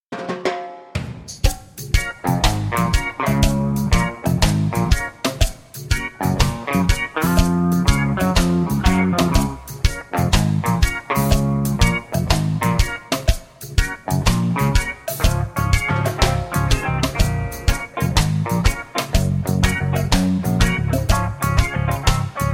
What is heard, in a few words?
Db No Backing Vocals, backing tracks